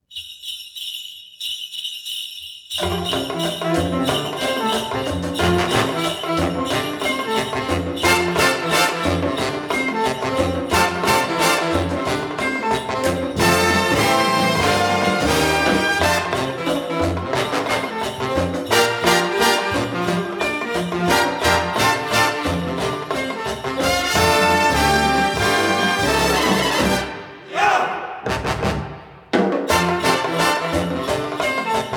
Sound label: jingle bells mambo